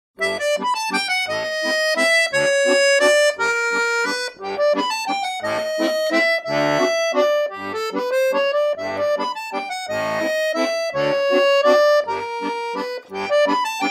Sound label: Waltz, bayan